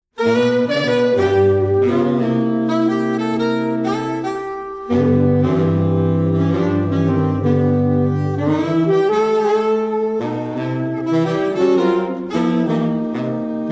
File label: For Saxophone Quartet